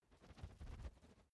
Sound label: feather flapping short; kreaturen